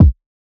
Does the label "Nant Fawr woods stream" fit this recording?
No